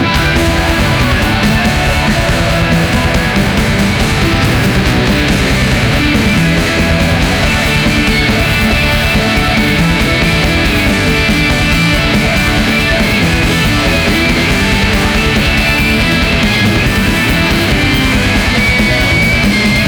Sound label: strong rock metal, concert